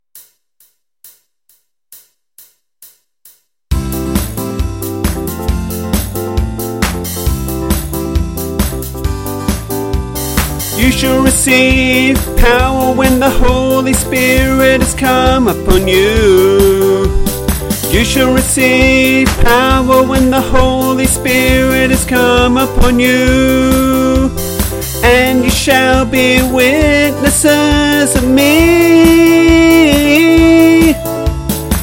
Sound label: disco